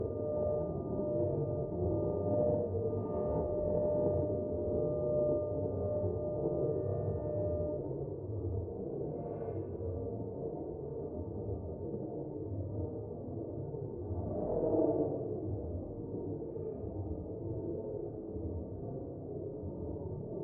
Atmos Tonal Stuttery Granular Ringing Drone, Alien and Imagined Atmospheres; Submerged Tapes